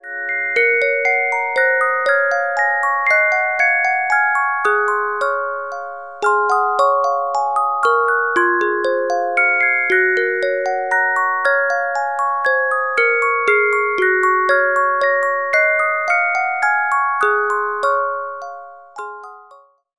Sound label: Carillon Music Box Melodies